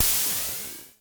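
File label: noise, Klone Dual-Percussion-Synthesiser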